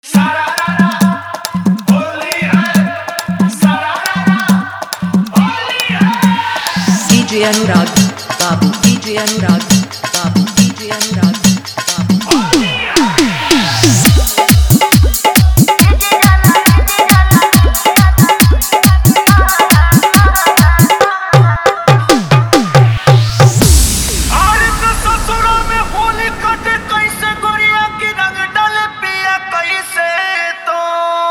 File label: Bhojpuri Holi Jhankar Dhollki Bass Mix